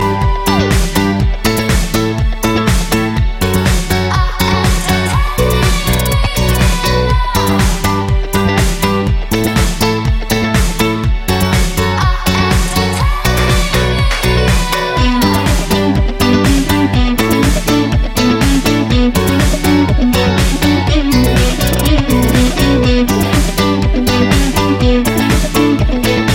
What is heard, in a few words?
best beat